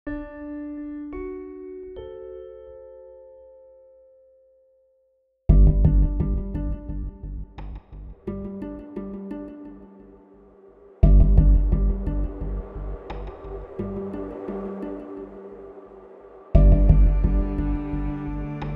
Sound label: rock